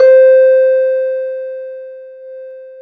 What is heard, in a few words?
PIANOS, C5; USB Soundscan vol.51 - House Side Of 2 Step; AKAI